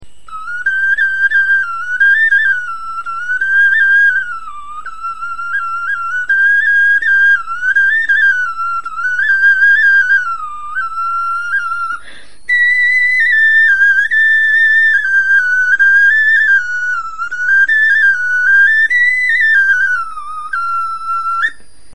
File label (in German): melodie, knochenfloeten